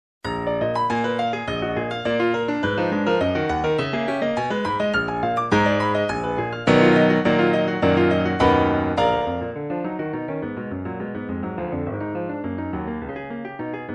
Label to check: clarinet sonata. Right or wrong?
Right